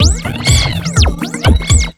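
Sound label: 127 drum 2 R, Fresh Disco House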